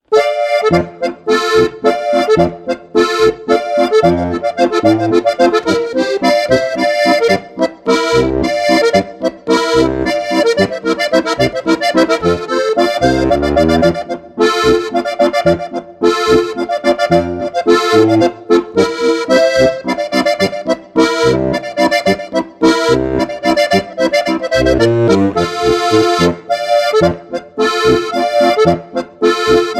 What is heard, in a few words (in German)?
walzer